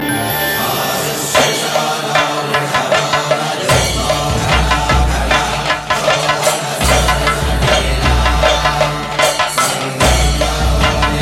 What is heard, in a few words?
Sacred Chants